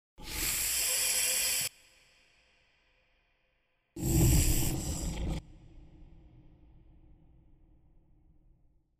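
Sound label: vampire hissing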